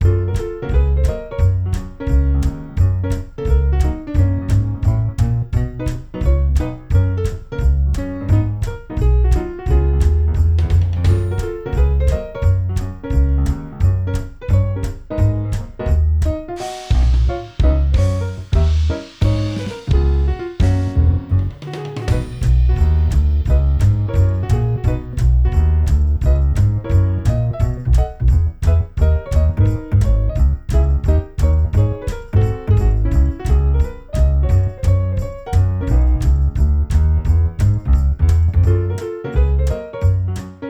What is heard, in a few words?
Gameplay; Everybody 1-2-Switch!